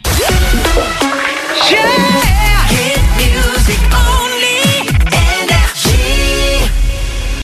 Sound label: Jingles; NRJ Shotgun ID (2025)